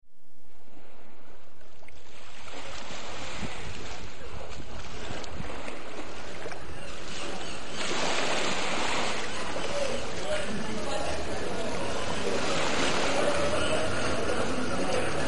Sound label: Waves street people & gulls; beach; Composite location sound recording, does not contain orchestration.; This time the waves are quieter and softer from the right.